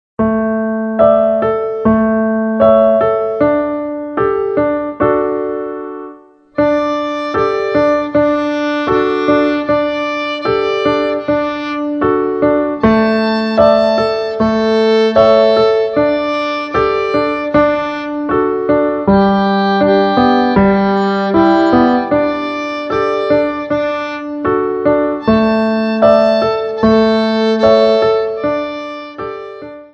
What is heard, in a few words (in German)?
schlafliedchen